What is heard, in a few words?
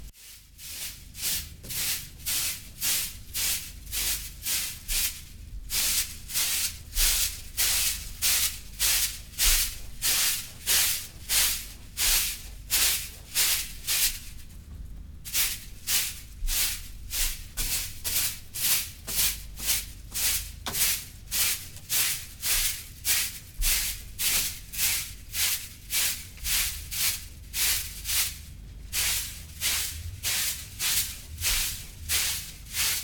Sweeping